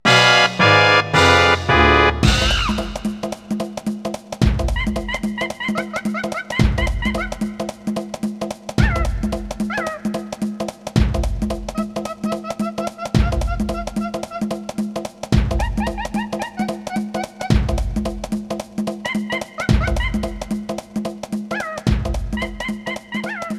Lose Race; Donkey Kong 64

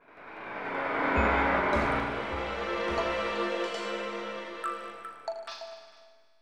Game Over; Super Smash Bros

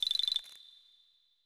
Pick Up Ice Eggs; Banjo-Tooie

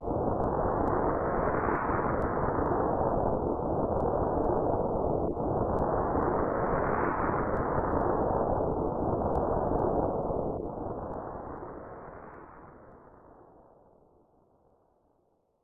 Howling Wind; Legend of Zelda, The - A Link to the Past

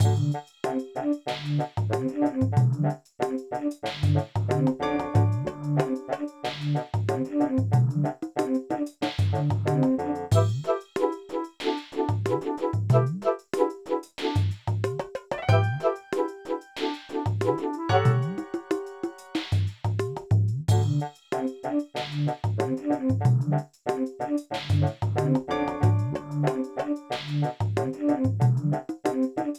Level Complete; Rayman 2 - The Great Escape; King Door

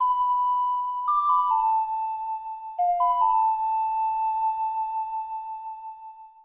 Ocarina; Legend of Zelda, The : Majora's Mask

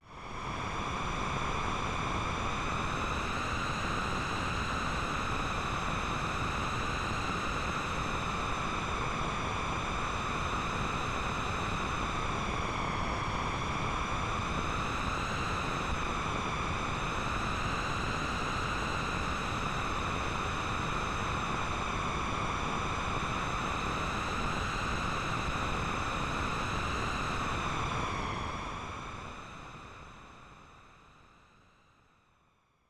Wind; Super Mario All-Stars

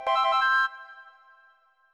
Pick Up Gold Feathers; Banjo Tooie

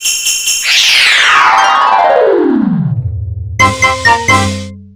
Level Ending short with Bell; Nintendo 64; Yoshi's Story

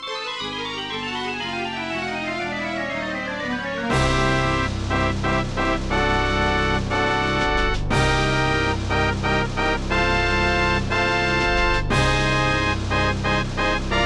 Blowing; Banjo-Tooie

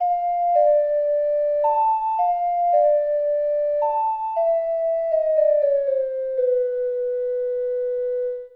Ocarina; Nintendo 64; Legend of Zelda, The - Majoras Mask

